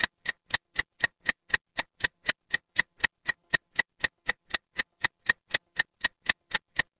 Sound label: mechanical clock tick